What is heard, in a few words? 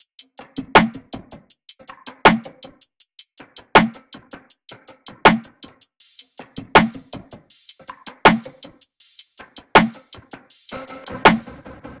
loop top 160, Seismic Loops